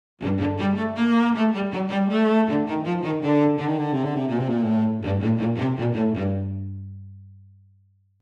Game over you lose action arcade

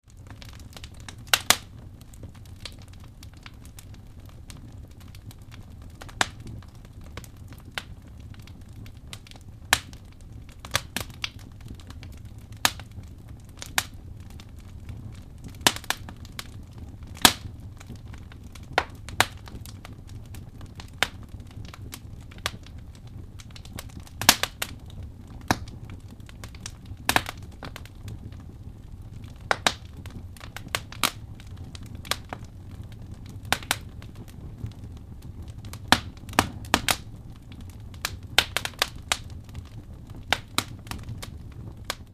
Crackling and burning birch wood

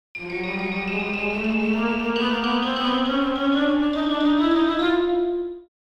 Cartoon orchestral scale

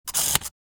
Camera movement lens focus zoom

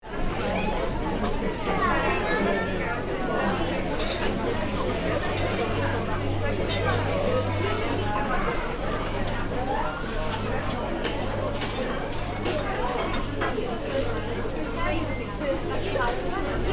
Cafe ambience Newcastle UK

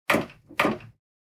Hydraulic chair adjustment foot pump

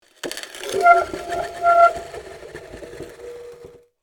Metal fence short squeaky movement; squeak sound effects

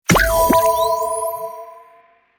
Complex tonal notification

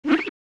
Glass window cleaning

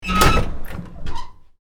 Ship door hatch open

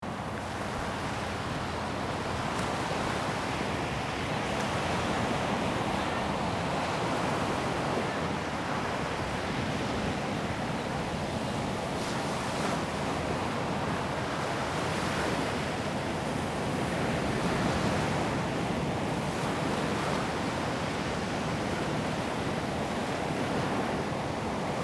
Open sea wind and waves ambience loop; ship

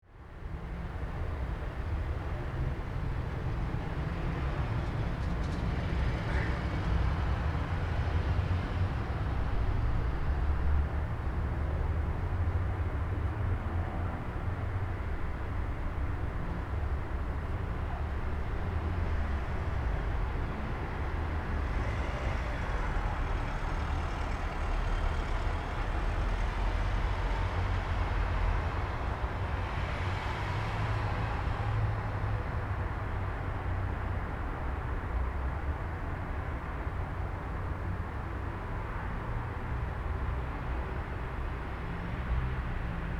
Night time street ambience with some cars passing

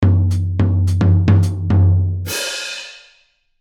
Comedy drum riff

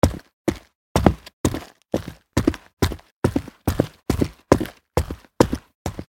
Footsteps on street boots fast walk

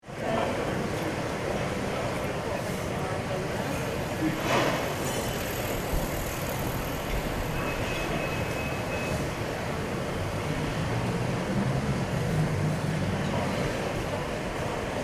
Outdoor cafe and restaurant ambience

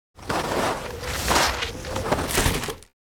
Skiing skier falling down on snow

